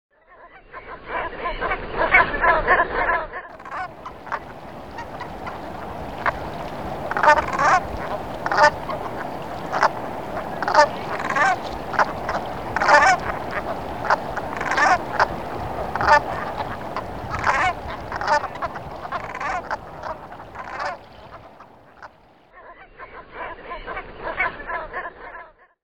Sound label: goose alarm